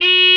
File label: honk; BESTSHOT; noisybit